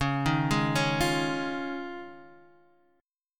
D sharp 7th Suspended 2nd Suspended 4th C sharp, Guitar